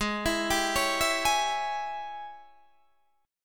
G sharp Major 7th Suspended 4th Sharp 5th, Guitar, Standard; arpeggiated; 16,19,17,18,17,16